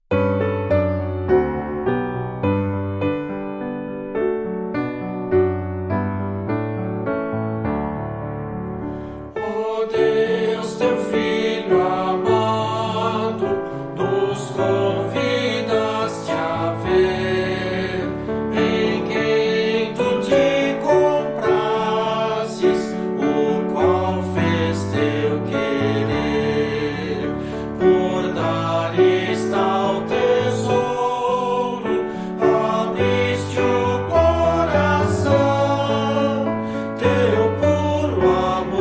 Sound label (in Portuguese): voz piano